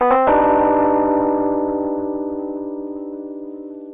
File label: moody rhodes; Piano and Epiano